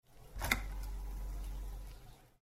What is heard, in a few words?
continue ui sound